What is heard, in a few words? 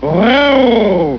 growl; homer; simpsons